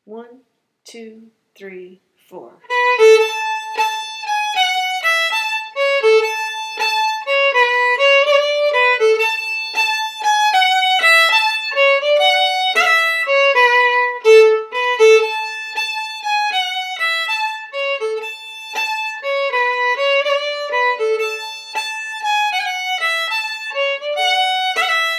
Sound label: Fiddle Medium